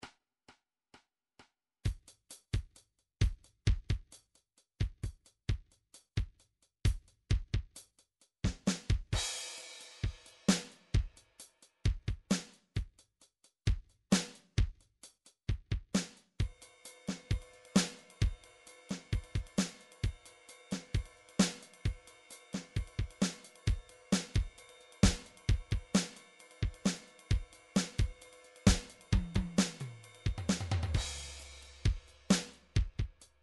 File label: drum trax alt 132